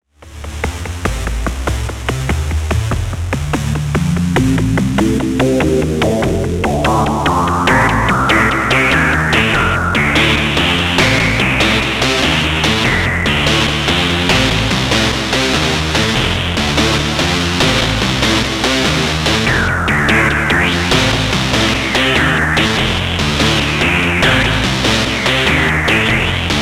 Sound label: riff